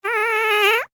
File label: Human Fart Squeak; SFX Bible